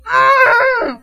Muffled Growl

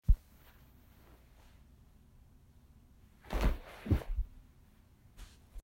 Clothing Ruffle

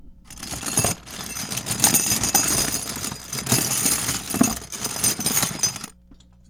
Metal Mess

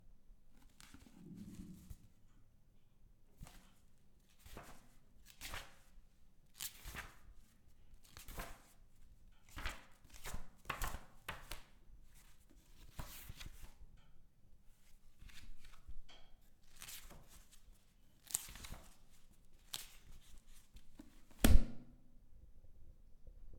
Book

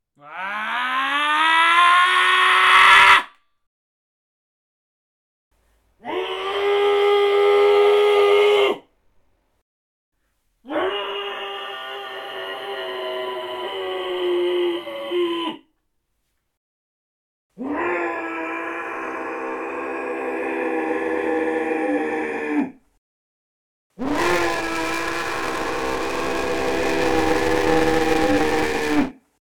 Single Screams

